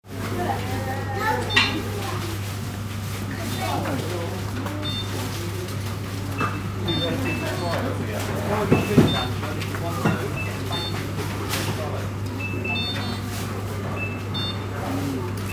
supermarket cash till

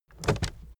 aluminium case steps

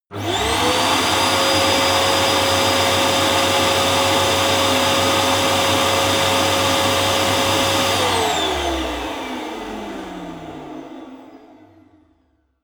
vacuum roomy steady turn off

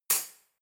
cutlery knife drawer place hard

